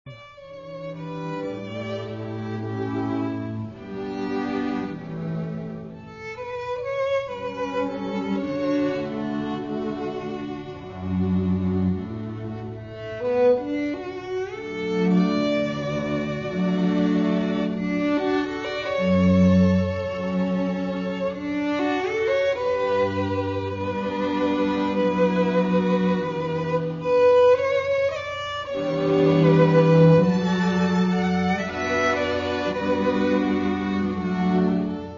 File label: waltz, Quartet; Reception; puccini; musettas